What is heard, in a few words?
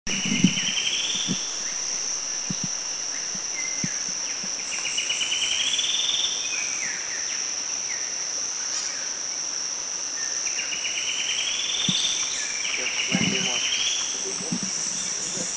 Rufous-fronted Tailorbird, Philippines